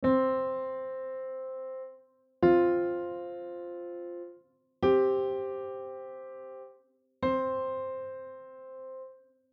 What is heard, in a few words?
interval piano perfect